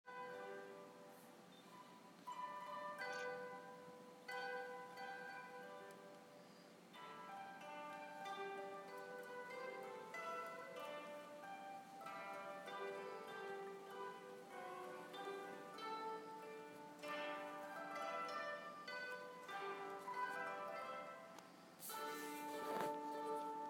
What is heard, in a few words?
japanese koto music